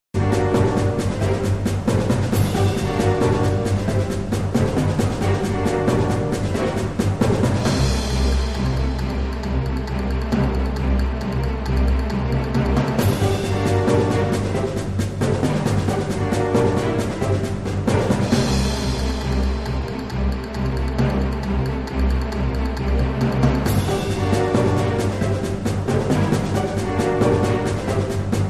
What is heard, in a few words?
the clock is ticking